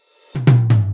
Fill Ins 128BPM; Vengeance Samples; VEH2 Loops

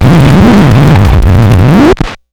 Needle Drag, Vinyl Record Samples; Needle Noises

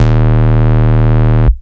Bass D#; Vengeance Samples; Vengeance Ultimate Bass

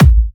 130BPM Kick; So Much Love Kit; Vengeance Samples

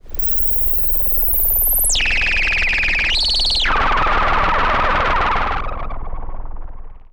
Roland E Noise, Roland S100; musicradar-synth-samples

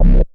Synth Bass; Vengeance Samples; VEE Synth Shots

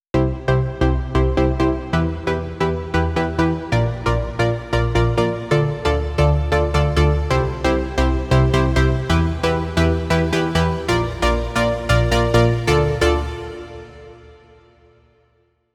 134BPM Pluck Intro WET, C major; Unlooped

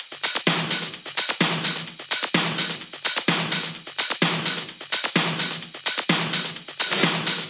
128BPM Classic Kit; VFH1 128BPM The Classic Kit

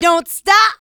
vocal house adlibs; PS-28 Vocal House Samples

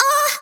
Synths D; Vengeance Samples

